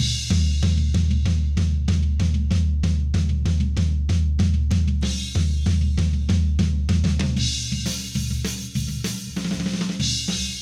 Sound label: Toms ONLY FULL EQ